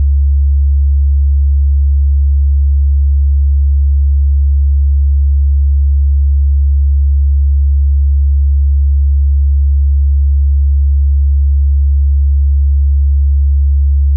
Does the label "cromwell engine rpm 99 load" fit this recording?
No